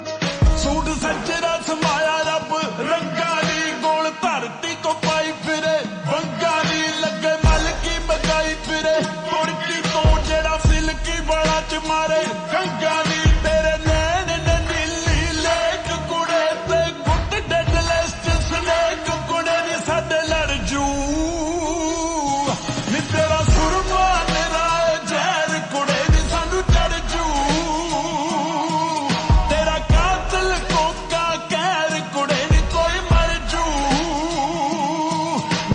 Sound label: Slowed Reverb Ringtone